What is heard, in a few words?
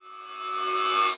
Flash Wipe Electronic; Multimedia Internet CD-Rom Flash